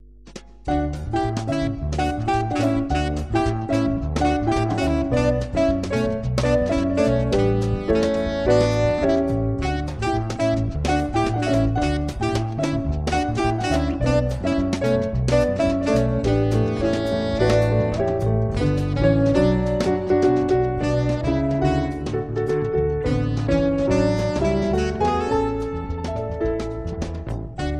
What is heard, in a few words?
jazz; générique du Téléthon 2002, inédit